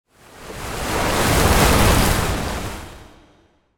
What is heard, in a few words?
hard morph